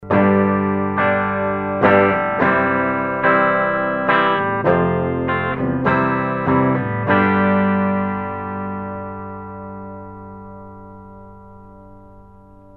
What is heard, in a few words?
bridge clean; bioroids; moscato